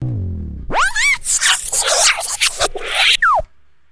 Turntables

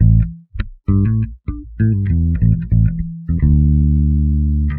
Electric Bass; Basses

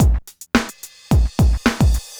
Old School Break, Drums

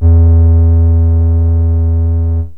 pipe lead, yamaha rm1x synth

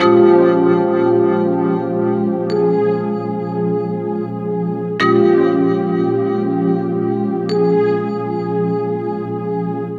Electric Piano, Loops, Keys

